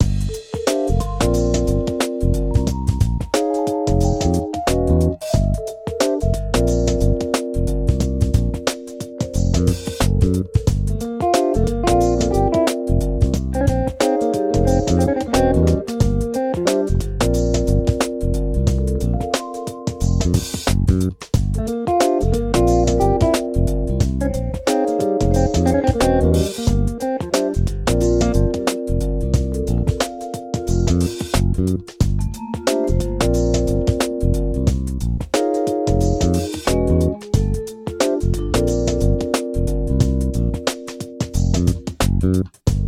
Stealthy Stake Outs Ambient BGM; Kurohyou 2 Ryu ga Gotoku Ashura hen

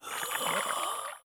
exploding zombie charge; Hollow Knight

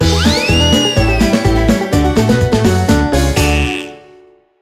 Sting Happy

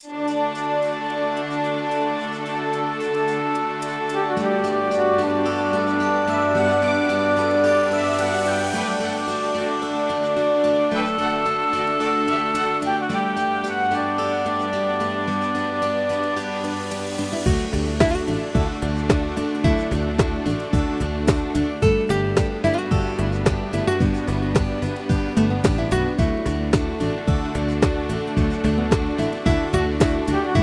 Chute Puzzle; barbie horse adventures mystery ride

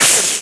hiss

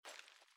armour Light Step; gamerip; Out of Reach: Treasure Royale

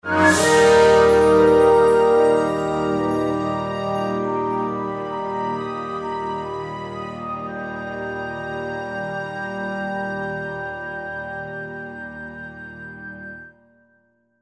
Medium Victory; CivCity Rome

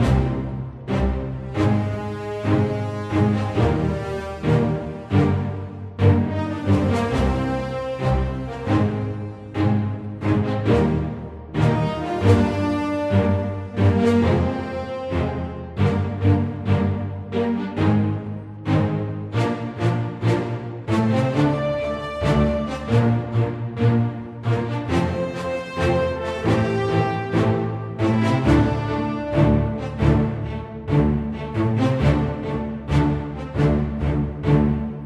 Gamplay Loop Strings And Brass

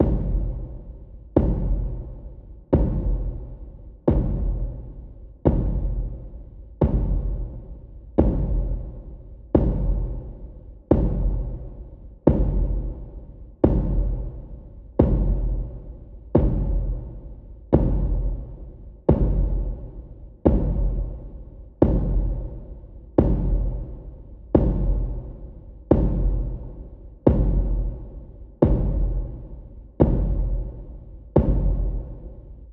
BATTLETRACK Bassdrum; song of iron

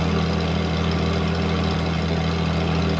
Engine Loop

